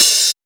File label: HiHat, closed hats